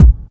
warm sounding kick drum one shot a sharp key

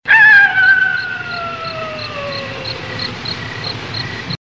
coyote howls; animals

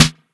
wet snare single hit D# key; snare drum samples

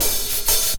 small reverb open hat one shot g sharp key; Duration 0:01; Samplerate 44100 Hz

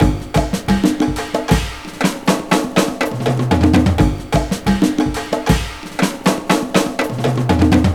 120 bpm drum loop sample c sharp key; Samplerate 44100 Hz

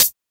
2000s Crisp Hi Hat Closed Sound D#; hat samples

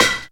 clear snare single hit b key

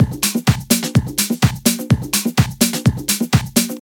techno drum fill spanish; drum loops

